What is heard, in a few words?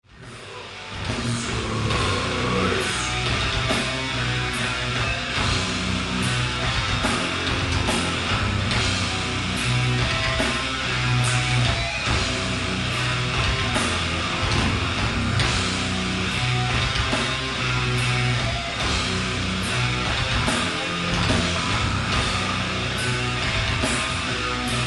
Funeral Hymn extract